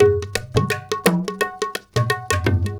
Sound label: INDIA R, Heart of Asia, IND ENS